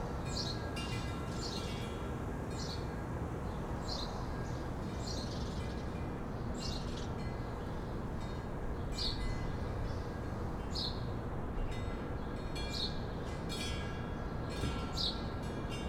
QUIET STREET Windchimes spring birds distant traffic